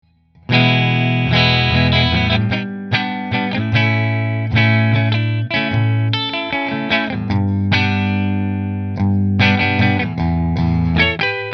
McNaught Middle Fender, guitars; McNaught Purple

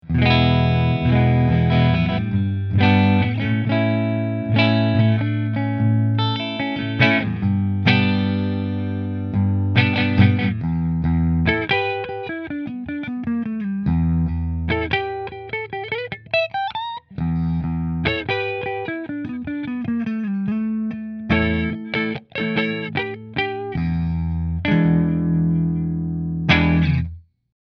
Prestige Middle Fender; Prestige Guitars Heritage Hollow SB QM

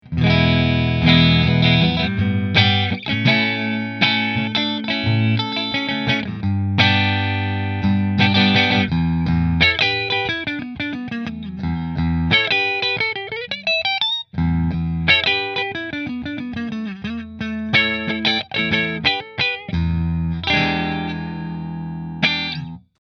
Rahan Single Maple Middle Fender; Rahan Guitars RP Single Cutaway Figured Maple

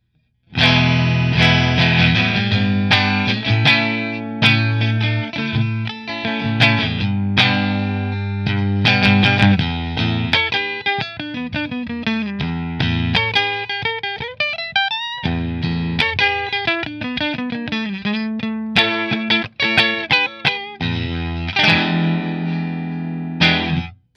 Fender Strat Kinman Position 1 Fender, guitars

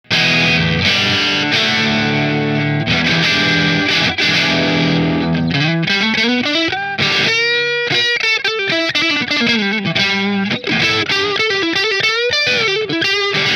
Custom shop strat position 2 marshall, guitars

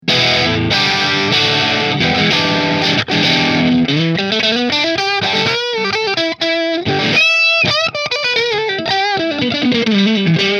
Nola Model 8 Bridge Middle Marshall, guitars; New Orleans Guitars Model-8 Sunburst

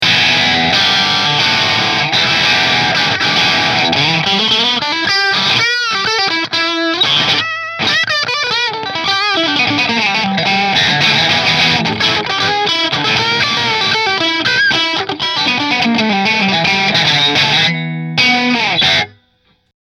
Rahan Ambrosia Position 3 Marshall, guitar; Rahan Guitars RP Single Cutaway Ambrosia

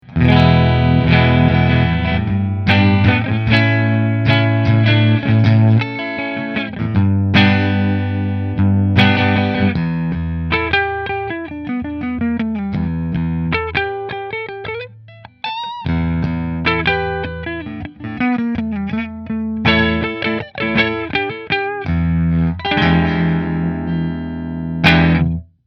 Nola Voodoo Neck Fender, guitars; New Orleans Guitars Voodoo Custom Natural Finish

